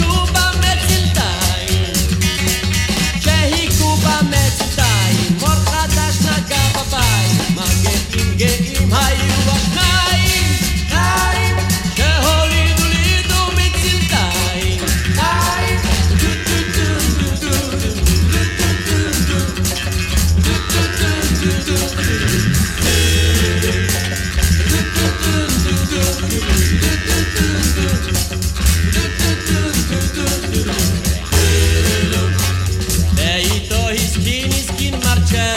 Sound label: Cymbals; Zohar; Zemer Nuge